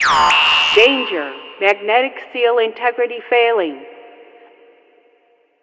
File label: Magnetic seal Failure